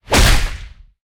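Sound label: magic explosion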